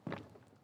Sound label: Step